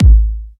tr 909 kick g sharp key, Royality free tr 909 synth kick tuned to the G# note. Loudest frequency: 192Hz; kick drum samples; one shots